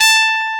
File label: TRUMPET, E.POP